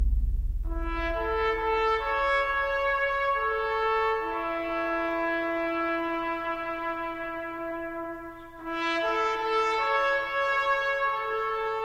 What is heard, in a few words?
Trumpet; Apollo